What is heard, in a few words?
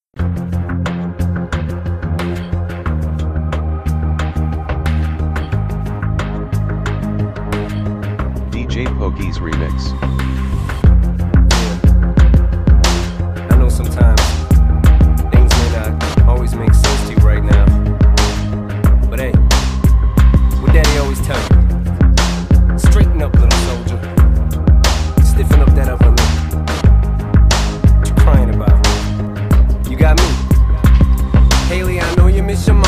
instrumental remix